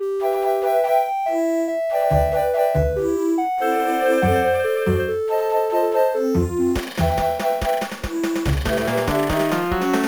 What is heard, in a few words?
Level Intro; Channel Chasers; Nicktoons plug play